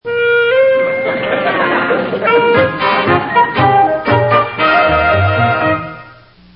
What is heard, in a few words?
music; haskell